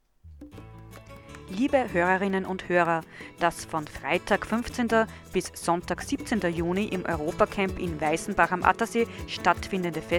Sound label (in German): Jingle Samstag Musik